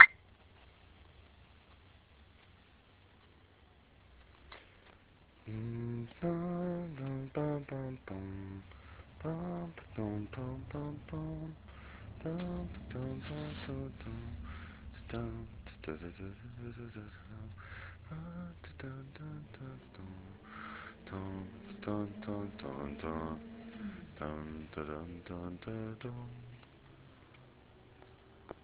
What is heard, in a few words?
Idea Voice Only washed up